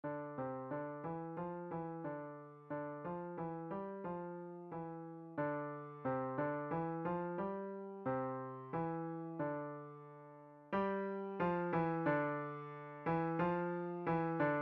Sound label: Legato hands together, piano